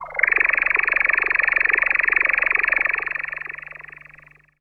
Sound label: EFFECT, Explosive Jungle; USB Soundscan vol.05; AKAI